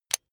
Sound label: electric button drill direction switch forward heavy